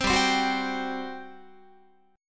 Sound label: G Major 7th Suspended 4th, Guitar; GM7sus4; strummed; 15,15,15,16,16,18